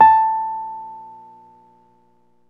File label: WURLY A4, Electric Pianos; E-MU Formula 4000 Series Vol. 3; Analog Odyssey